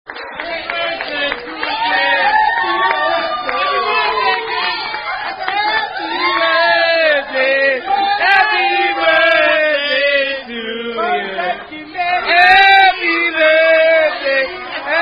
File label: Happy Birthday Sung By Wait Staff; Happy Birthday (Standard) Sung By Chili's Wait Staff (Fragment)